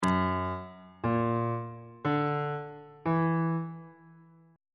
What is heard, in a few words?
f sharp augmented 7th chord intervals